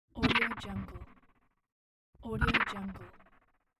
whoosh short fast zooming out; bestsfx

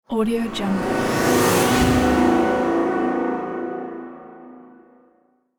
cinematic whoosh impact

